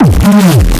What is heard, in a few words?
Cool Reese Bass